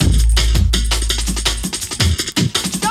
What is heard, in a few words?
Break, Jungle; M8